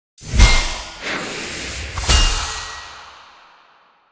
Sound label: main Game Wild Won; lost relics